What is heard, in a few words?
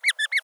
Chestnuts Prompt Call